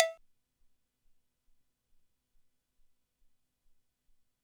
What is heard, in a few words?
Cw bell, Drum Machines, ELKA X1000; 300 Drum Machines